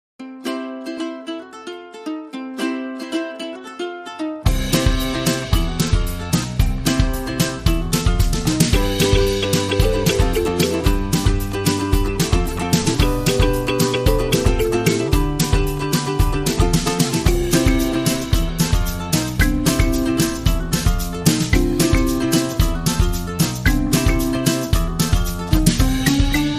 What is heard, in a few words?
Ukulele Hayride